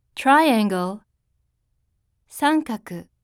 triangle

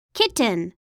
kitten

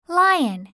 lion

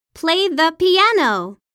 play the piano

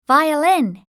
violin

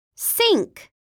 sink

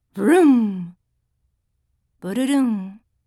vroom

